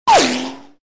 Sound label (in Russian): Промах патрона игрок под обстрелом